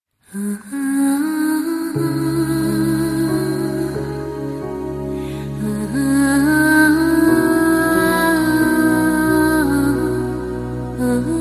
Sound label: Rington Hindi; Sad